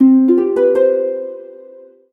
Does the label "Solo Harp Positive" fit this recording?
Yes